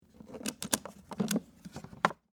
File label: Typewriter Case, Technology, Typewriter, Case, Open; Typing; Offices